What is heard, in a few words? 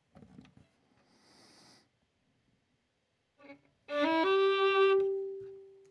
Violin